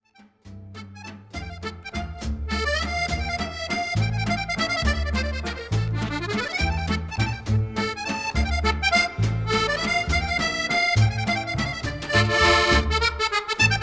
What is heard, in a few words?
Leadin, accordion